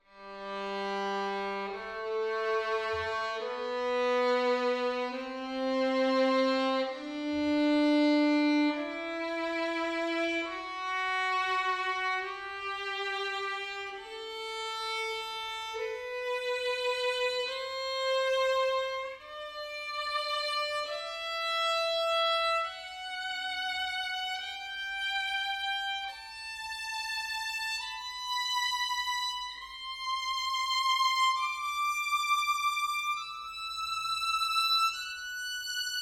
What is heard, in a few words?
Violin G major scale solo